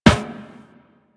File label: Run Metal Perforated, Footsteps